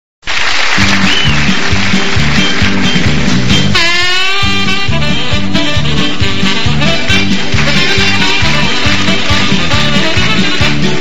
live version

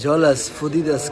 solo

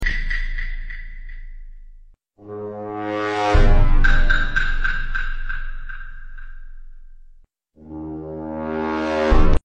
sus slowed; among us

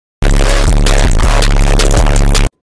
loud indian music

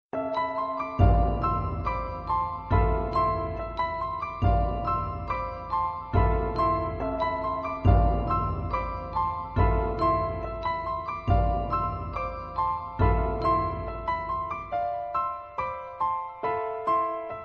piano

